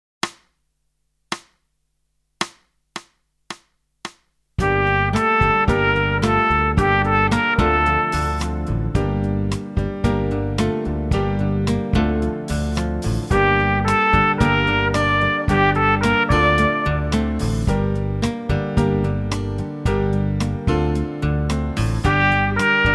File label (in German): Echo Ubung